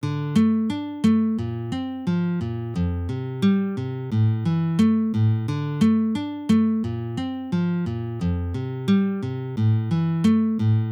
perebor; taby

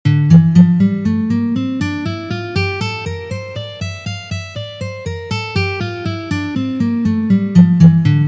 Gamma Re minor naturalnyj vid 2 pozitsiya

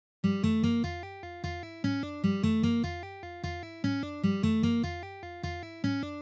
Vertushki na gitare; taby

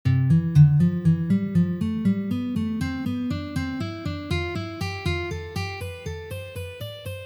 Uprazhnenie dlya igry gammy Lya minor

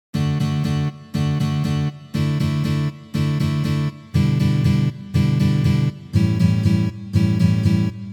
Uprazhnenie s akkordom A7

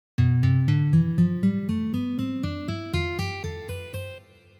Lokrijskij lad

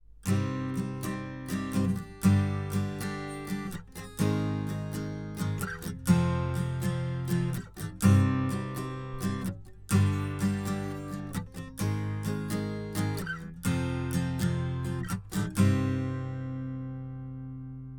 Akkord Cm uprazhnenie

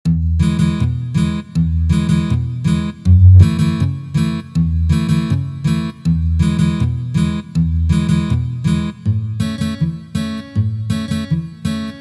Boj Kantri na gitare

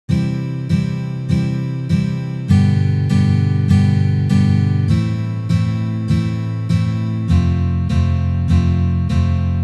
Uprazhneniya dlya igry akkorda Do mazhor na gitare

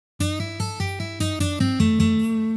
Blyuzovye liki i uprazhneniya

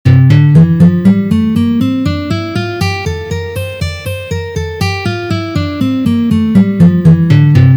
Gamma Fa mazhor 4 pozitsiya

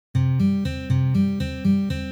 Voshodyashhie patterny

